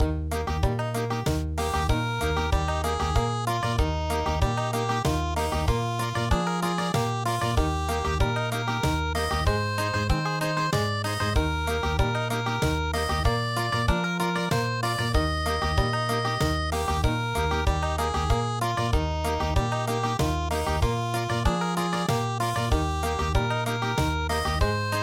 Country

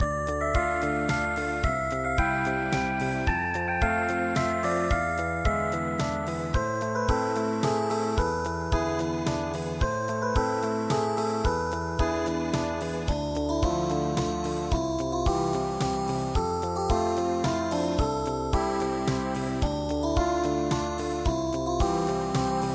ballade

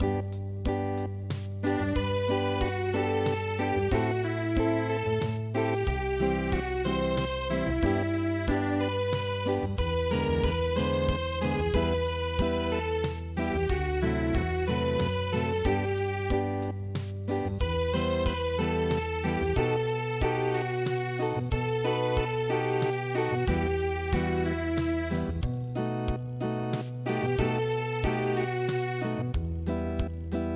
Unplugged, midi

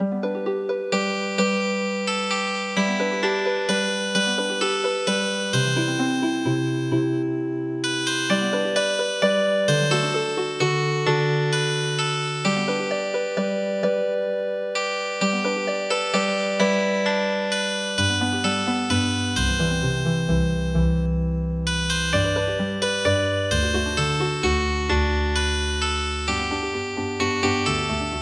Healin Harp; The Wild Rover

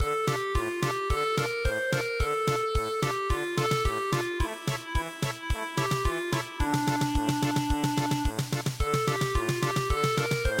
sambre et meuse polka

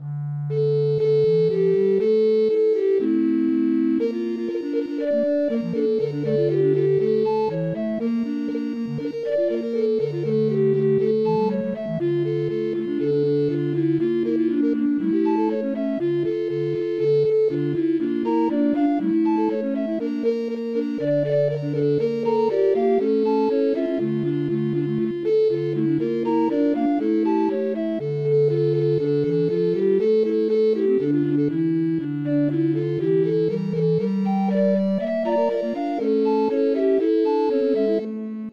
Recorder Quartet; Touryanse

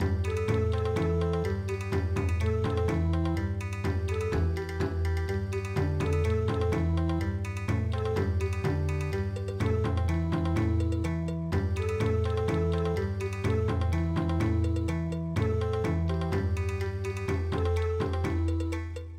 Bon Odori

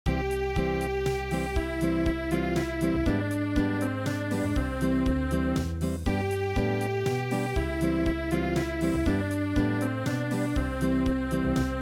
Dance Unplugged; Rhine River

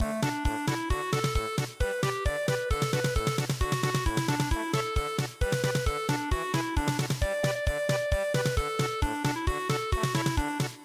soap bubble polka

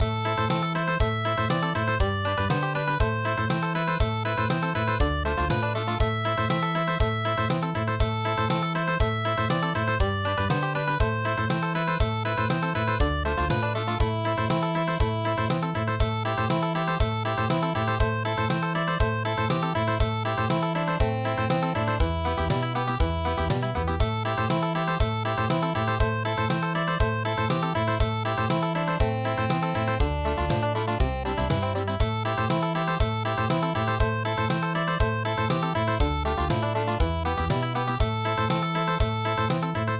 March Country; midi; traditional

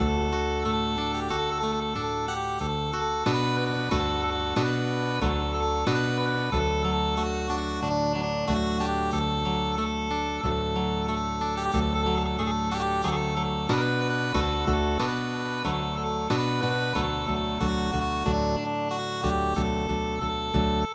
Chinese lullaby

